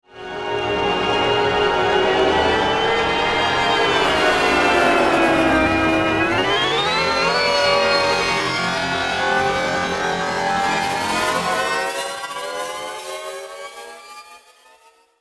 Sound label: Pitch Change